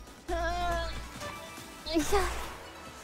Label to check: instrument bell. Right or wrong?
Wrong